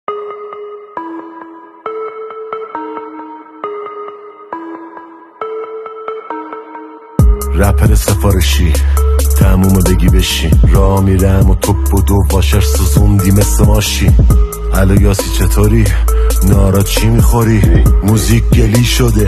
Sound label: Rap; Sefareshi